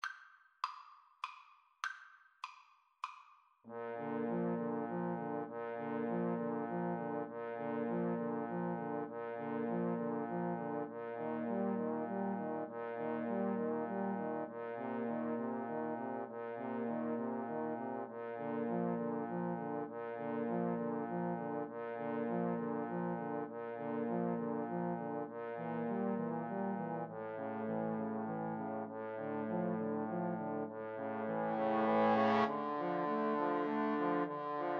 Trombone Trio; saint saens swan

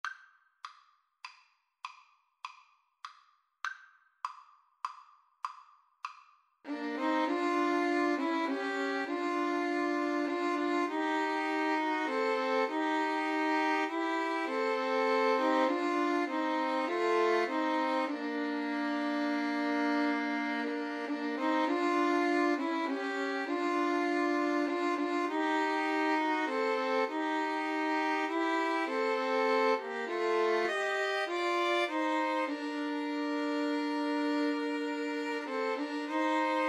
2 Violins Cello

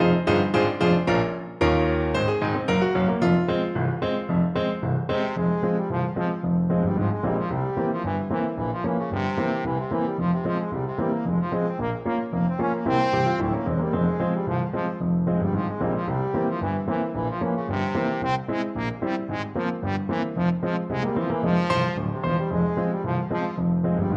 trombone

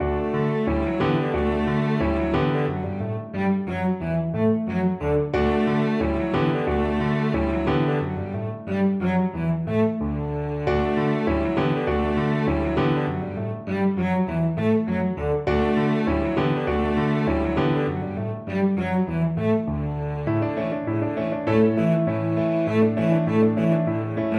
musette, cello

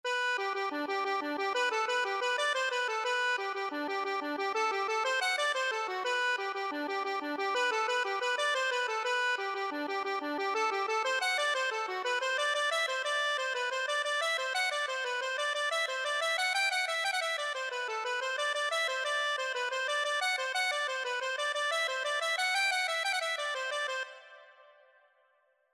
accordion

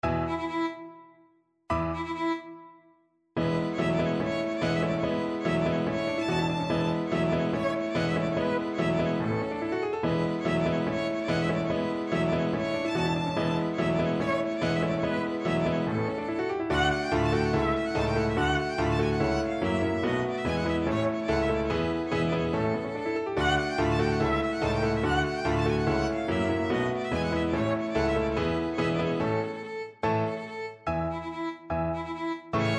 violin; beethoven 7 4th mvt

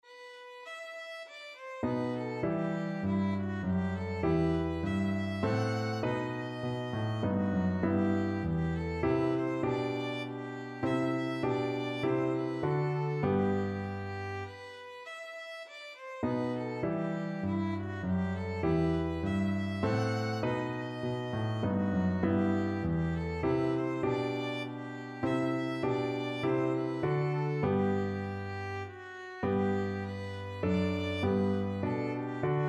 Minuet violin; Purcell

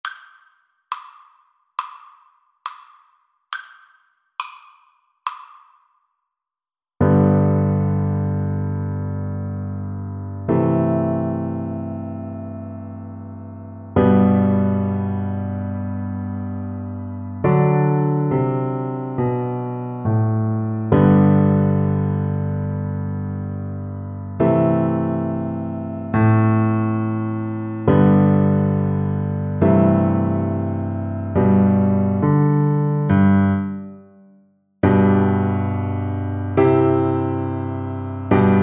Double Bass

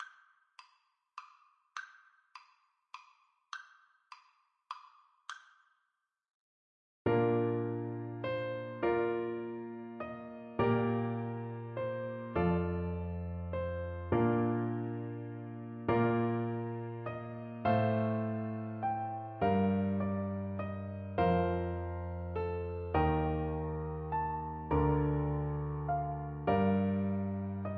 begotten FL kar, Flute

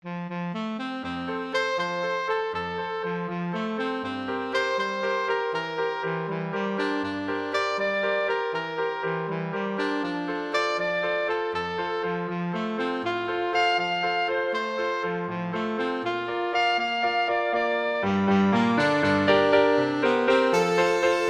Waltz for Saxophone; blue danube; Strauss II,Johann

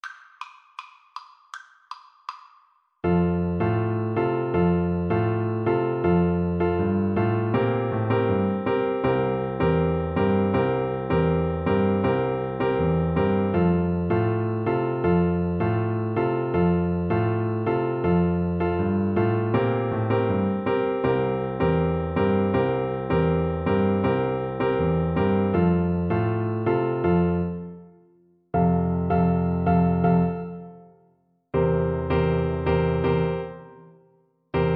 la cucaracha BN, Bassoon

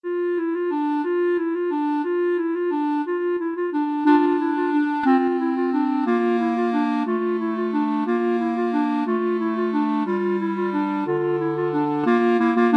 carol of the bells, clarinet duet

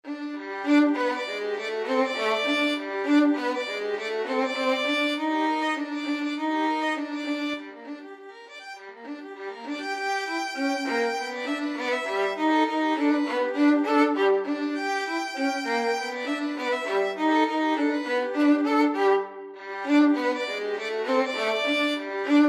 maple leaf rag violin duet

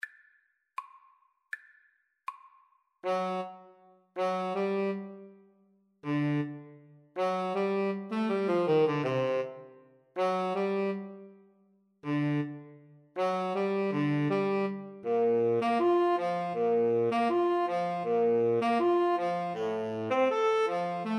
Alto Tenor Sax Duet

